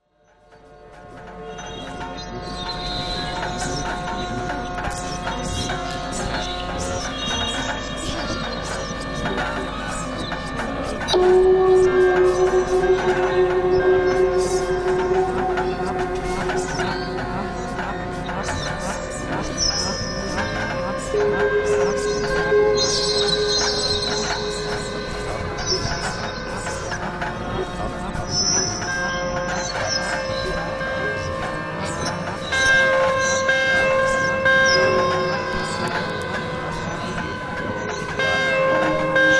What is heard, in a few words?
some decorated synth stuff; PHOBoS